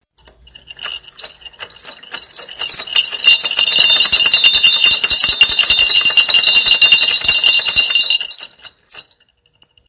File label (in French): grelot